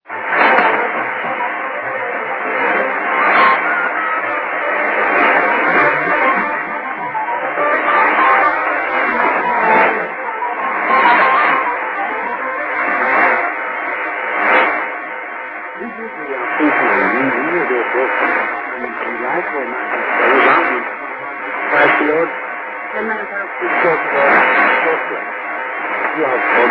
African Union Radio 7165 KHz

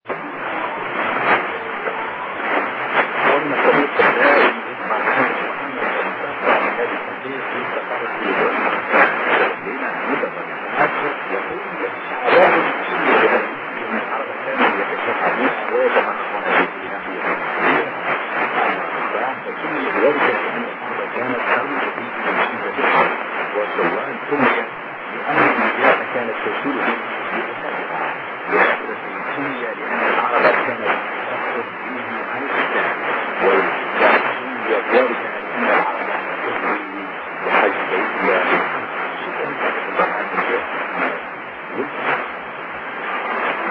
4845 KHz; Mauritanie

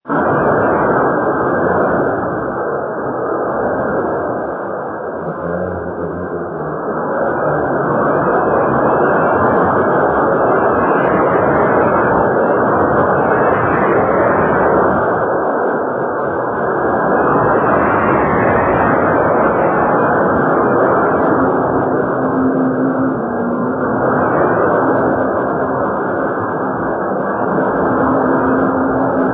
2960 kHz; RPDT

